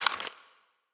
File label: Menu SFX Achievement Book Page